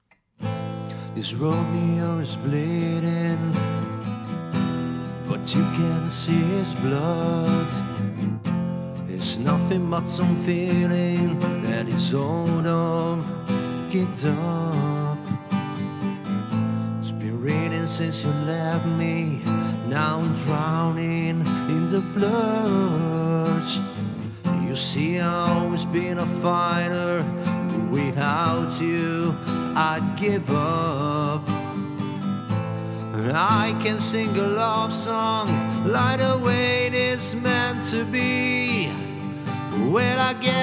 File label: Guitar V76 Preamp Vocals; Austrian Audio OC818